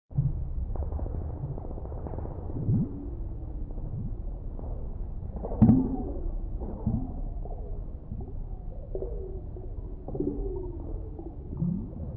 Eerie Well Pings, drips

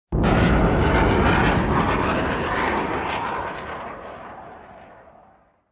Surface to Air Missile Lnch, exterior

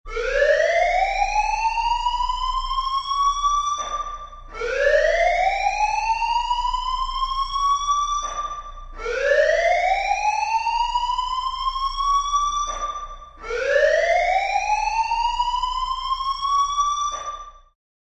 Alarm Fire Building

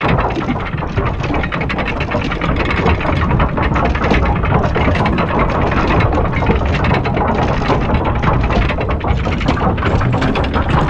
Drawbridge Door Rum

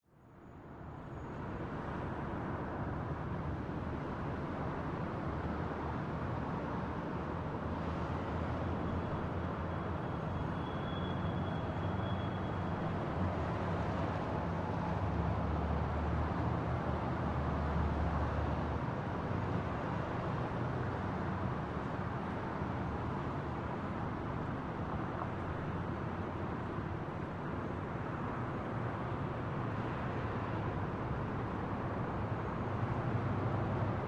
Traffic Off Screen

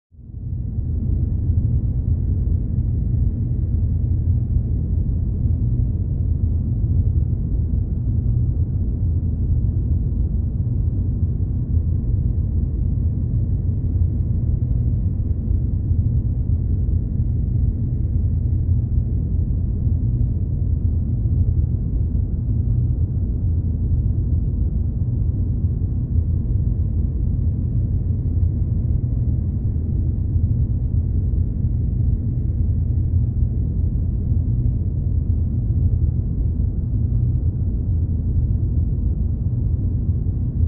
Drone, horror

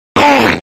Seal Elephant Call Si

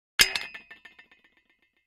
Clamp Drop On Cement; crashes & creaks

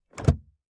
vent lever; dashboard accessories

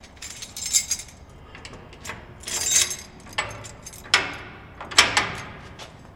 Key Mvmt Into Lock, lock & keys; Key Movement and Into Lock

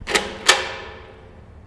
Jail Door Latch Open; jail & prison doors

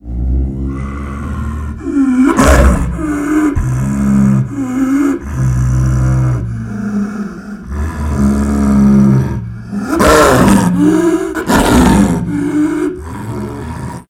Leopard Snow Growl; big cats

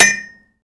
Bullet Shell Copper, shell casings fall

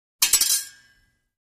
Double Sword Hits, scrape

